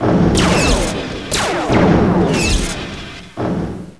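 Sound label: sword fight; ALIEN; Civilization 2 Multiplayer Gold Edition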